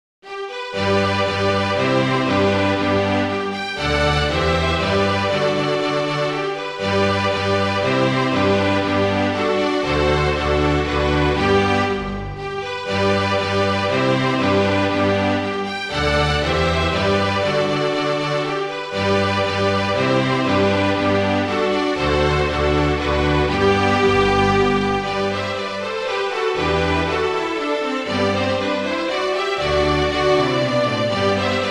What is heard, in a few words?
menuet